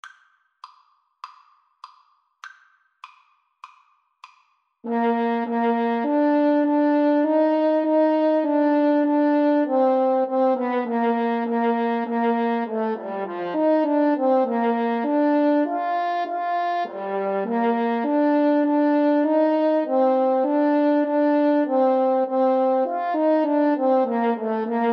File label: national anthem russia, Trumpet French Horn Duet